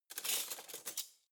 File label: cutlery spoons drawer grab soft